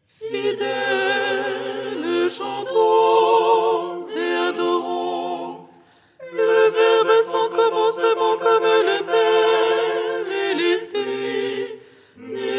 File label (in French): alto; Tropaire du dimanche; Les 8 tons en français